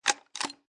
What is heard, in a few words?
Rifle Bolt Action Chamber Opened, Rifle Bolt Action Chamber Opened And Closed; Gun Handling; Lynne Music